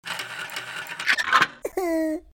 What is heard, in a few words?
Safe open